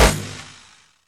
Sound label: minigun fire end; Weapons; DCS